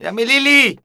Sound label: flamenco vocals, male; Best Service ProSamples vol.46